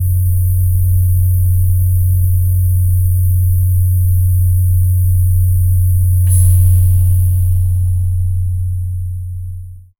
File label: steady low frequency electric